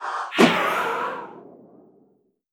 Sword

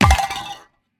Toaster

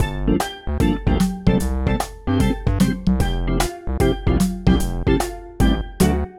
Credit Jingle; Outcore

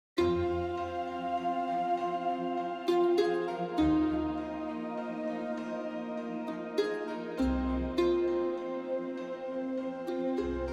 Sting Arctic Noon

